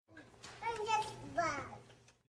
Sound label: Just Buzzed; Pearl